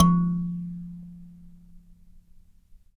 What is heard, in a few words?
Dyna Sansa; Roland LCDP11 Africa VOL-1; Sansas